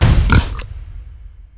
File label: arc attack; techies